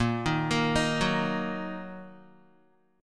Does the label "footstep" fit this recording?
No